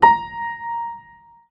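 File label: piano a#5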